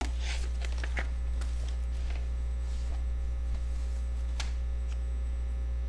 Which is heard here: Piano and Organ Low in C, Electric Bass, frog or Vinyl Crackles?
frog